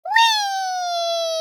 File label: Wee